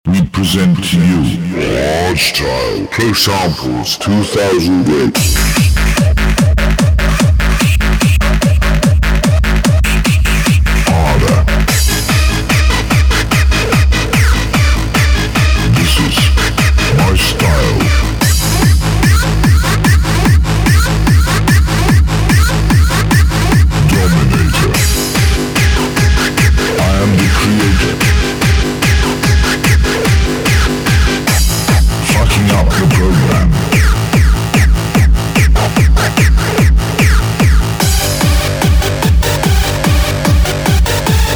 hardstyle

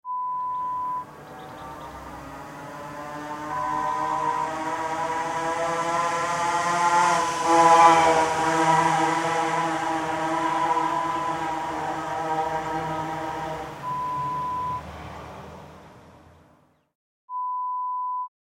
scooter sound

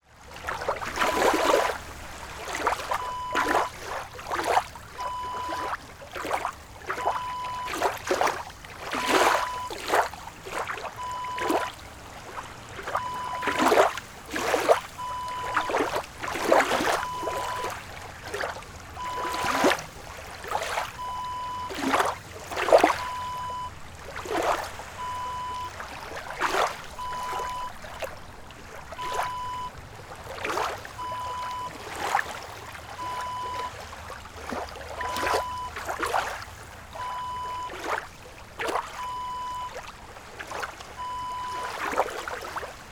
lake waves

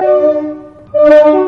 horn

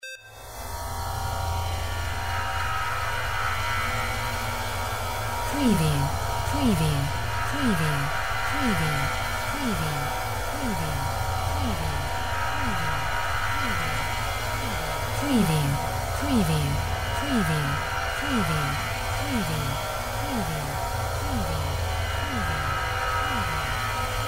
drone matrix phase fx

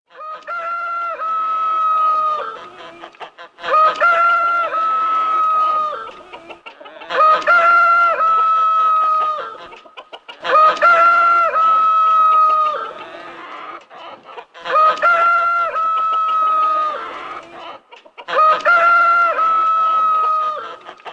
Rooster Cock Morning Wake Up

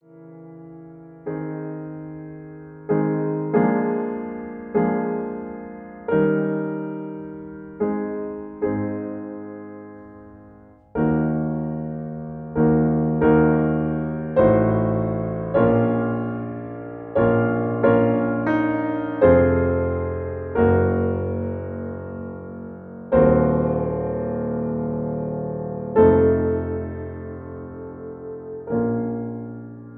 Accompaniment Aflat

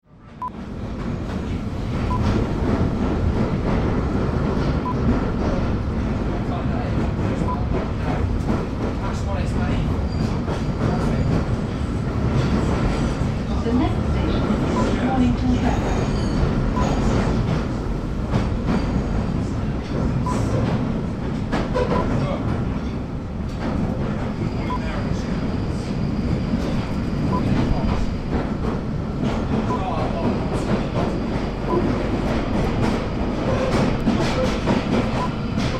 Northern Line Onboard

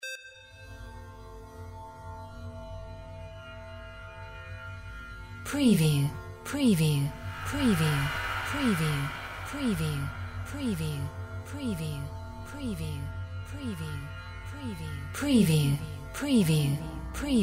drone matrix random fx

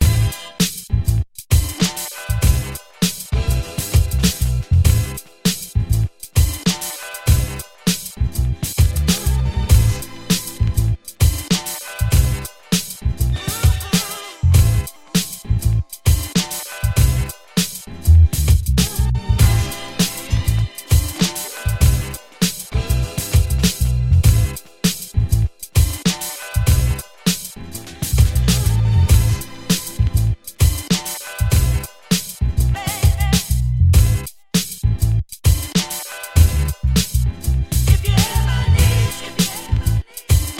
beat

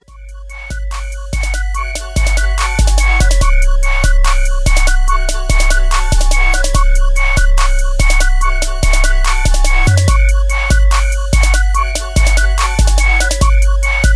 72 BPM

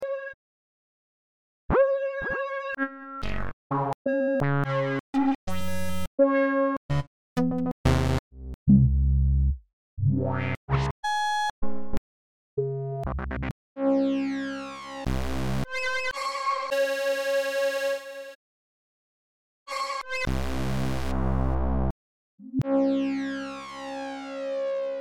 Minimoog

